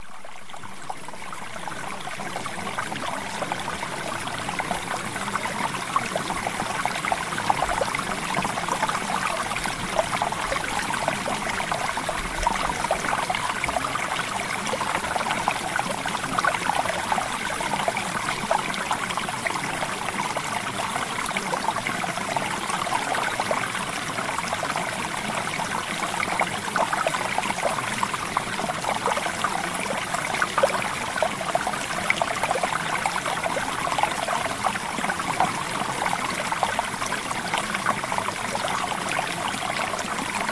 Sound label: Water; Premium Subliminals; Emotional Mastery